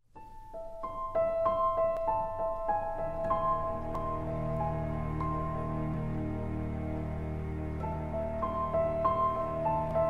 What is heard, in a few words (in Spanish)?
Tema Suspense